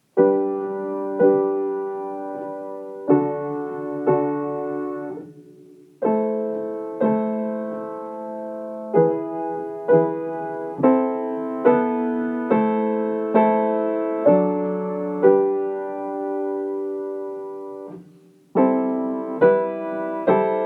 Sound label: piano